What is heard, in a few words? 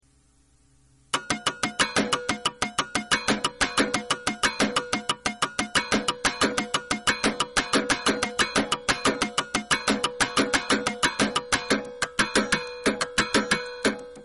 percussus